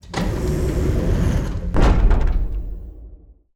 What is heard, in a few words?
Elevator Close